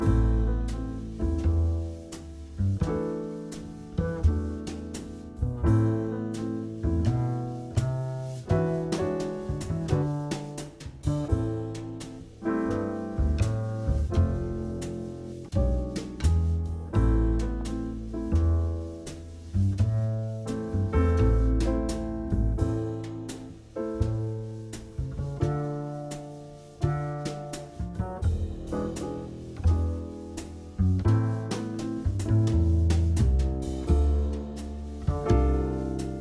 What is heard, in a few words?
Sleep Jazz